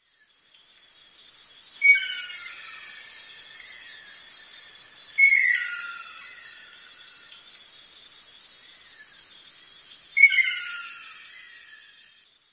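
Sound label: ruddy kingfisher, takara; akasho; koe; anezaki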